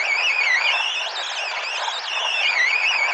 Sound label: Sliding; Marble Blast Gold